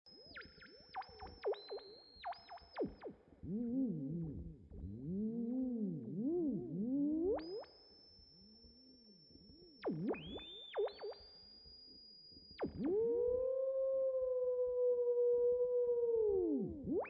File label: theremin